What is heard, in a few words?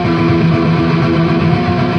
120 death metal guitars